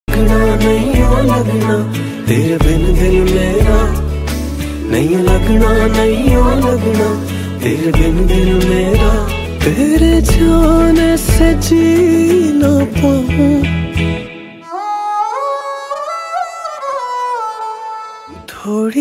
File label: hit song ringtone